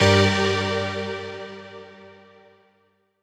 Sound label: Synth Shot; Vengeance Samples